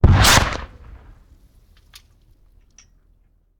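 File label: Cannon Cannonball; Sound Ideas; Guns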